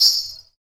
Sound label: PRC METAL, Afro Shakers, Ankle Pods; Roland LCDP14 Africa VOL-2